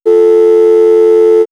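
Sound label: Long Tones, electronic fx; X-Static Goldmine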